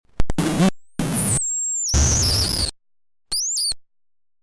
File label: unpredictabox bark